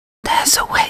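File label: new vocals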